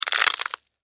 Insect Eat Attack, Insect, Eat, Attack, Juicy, Wet, Bite, Bug; Sound Effects:Animals:Insects